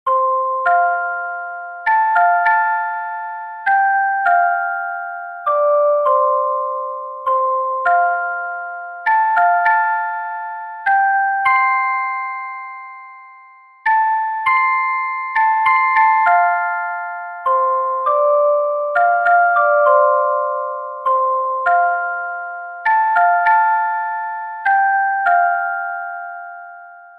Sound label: children handbells; handbells arrangments